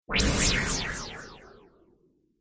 Laser Swish Delay, whoosh sound effect

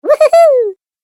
Cartoon Game Voice Woo-hoo-hoo

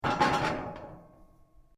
METAL ON METAL; Metal (Sound Effects:Materials)

